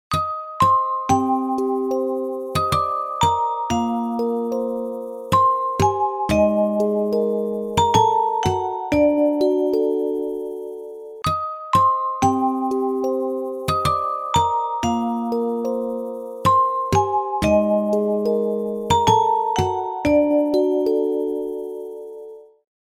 Dulcet, ringtones; Coolpad

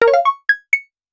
Send, notifications; Meitu T8

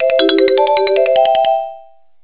Ring; Doro; PhoneEasy-337ip

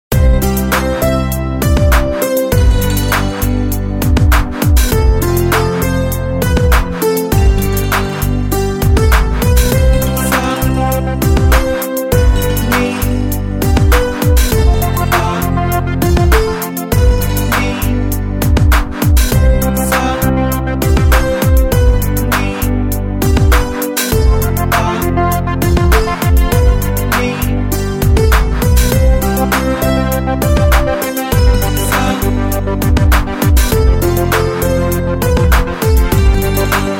Melody; LG; A290-Alt

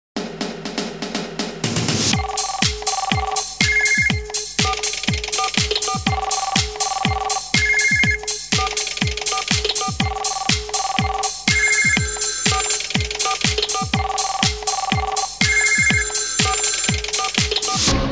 Old Phone; Coolpad

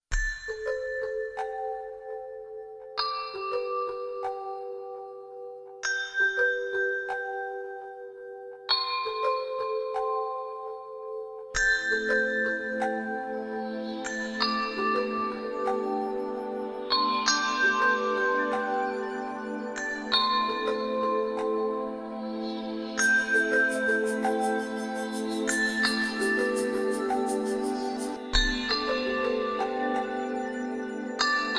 Drip, alarms; Doov A8

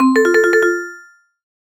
Small Bell, notifications; Meitu M2

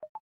Usb Effect, ui; Elephone U Pro

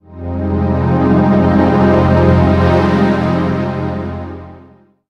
Ambience, notifications; LG P940 Prada 3.0

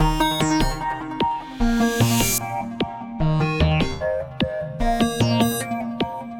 Electro Alarm, alarms; MobiWire HomePhone 4G

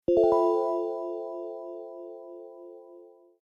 Evening, notifications

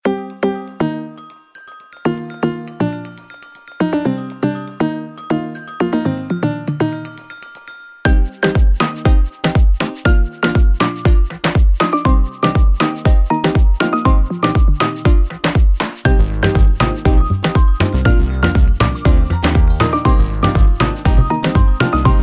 Reggae, ringtones; Infinix XOS 12

